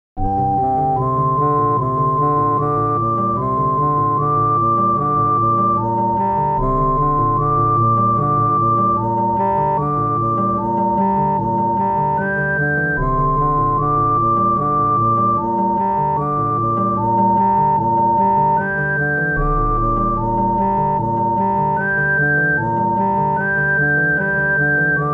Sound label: orientalish